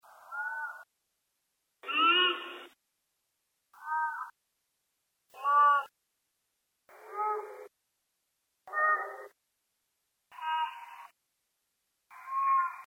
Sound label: Rana latastei; BIOAC AMPH